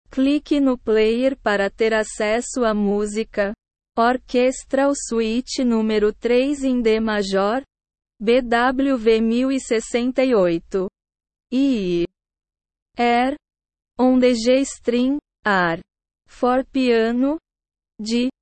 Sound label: air on the g string arr for piano; orchestral suite no 3 in d major bwv 1068 iii